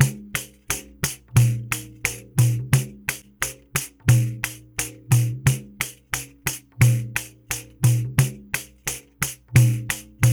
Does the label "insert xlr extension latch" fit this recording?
No